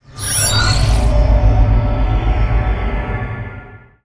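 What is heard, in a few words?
Summon Synchro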